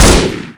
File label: assault rifle shoot; tf2